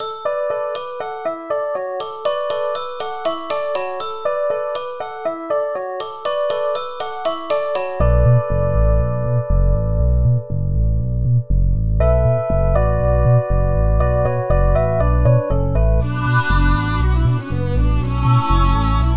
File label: Music Box